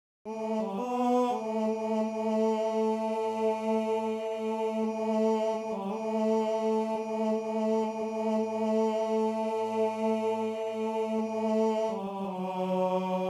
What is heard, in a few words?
tenor